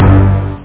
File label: bas orches